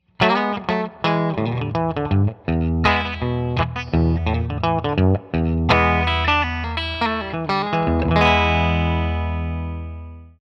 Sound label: horn